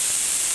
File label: spray can loop